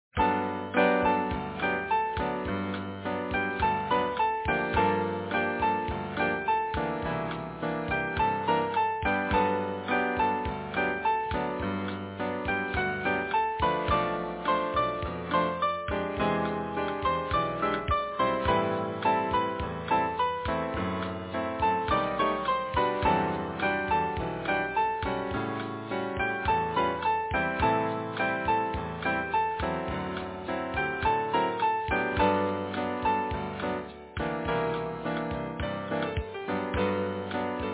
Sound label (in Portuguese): fotografia instrumental